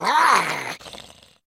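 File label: Monster Creature Growl, foley monster vocals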